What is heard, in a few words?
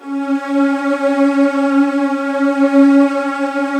C#1, Virtuoso Strings